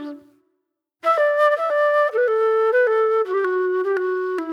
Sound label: Fusion Flute